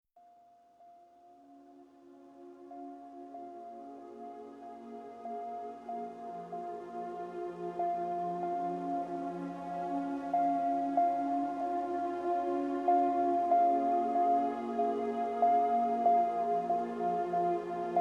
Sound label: melancholic ambient